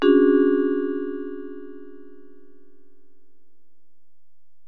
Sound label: Bell, Alesis DM-PRO